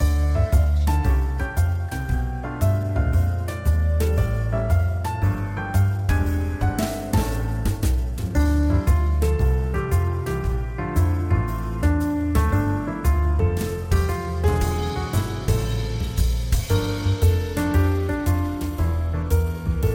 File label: upbeat walk; soundscrate